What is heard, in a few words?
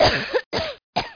COUGH